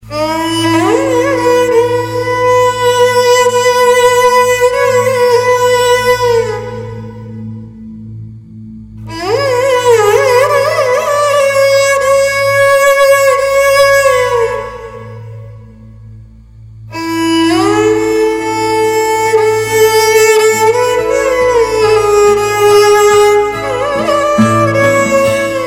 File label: Sad Violine